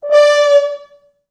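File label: French Horns; 4 FrHorns FXMOD; East West - Quantum Leap Horns Sax; 90's Sample CDs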